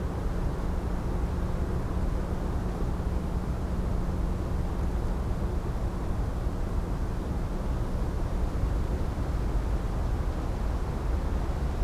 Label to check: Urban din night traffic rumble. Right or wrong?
Right